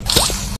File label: grabpack shoot; grabpack upgraded; pp3